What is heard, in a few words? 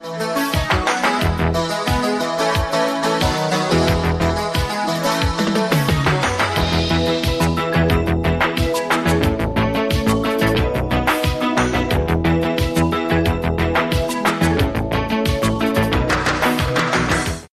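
would you dance instrumental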